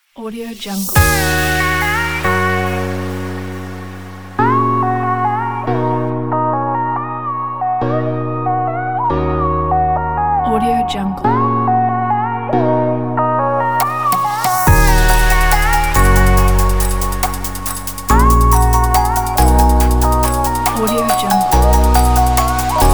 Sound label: the future bass